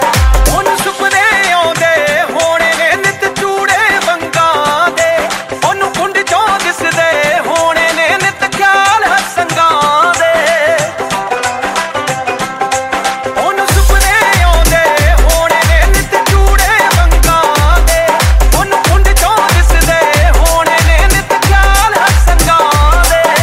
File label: punjabi ringtone